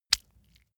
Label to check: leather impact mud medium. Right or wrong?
Right